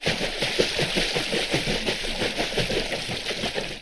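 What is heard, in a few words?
sounds of a person's footsteps in water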